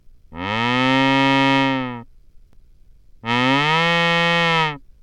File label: moo cow